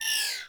Energy Rifle Projectile; Warframe

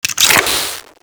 Reload, Weapons; Warframe; Acrid

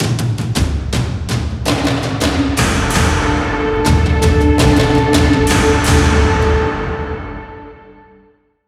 Mission Complete Success Cue; Warframe; Cues

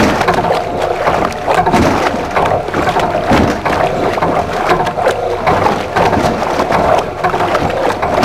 Machine Loop Water Pump; Props; Loops; Warframe; Venus Open World; Venus Landscape

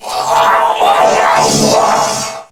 Death

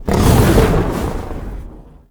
Flight End; Warframe; Uriel; Infernalis

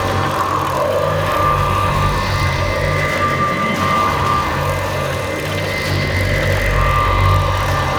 Energy Spiral Loop; Ambience; Props; Warframe; Entrati

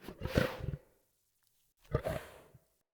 Wolf Idle Grunt, Animals Wolf Vocals

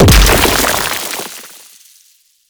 limb death dissolve; Enemies; Warframe; ManInTheWall

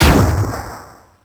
Super Weapon Bomber Explosion; Warframe; Caliber Chicks

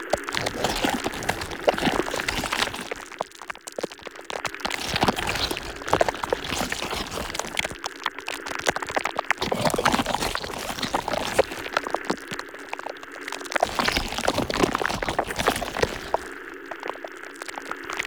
Ink Tendril Loop; Warframe; Shadowgrapher

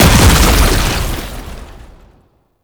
Tank Infested Charge Wall Slam; Warframe; Lasrian Tank; Abilities

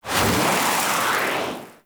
Dragon Idle Noble Breath Fire

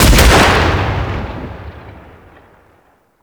Sniper Shot; Kahl

